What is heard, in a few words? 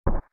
hammer; harvest moon